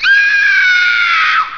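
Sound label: scream female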